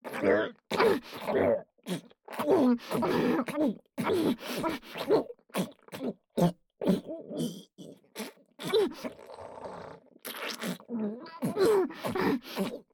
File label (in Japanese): ダウナー 喉奥フェラ; フェラ素材